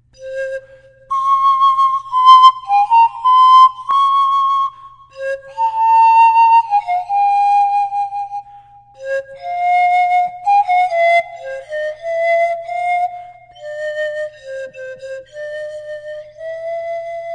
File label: C Pan Pipe